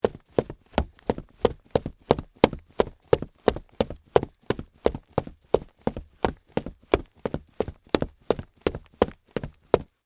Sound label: Combat Boots Run, Footsteps, Combat, Boots, Run, Wood, Surface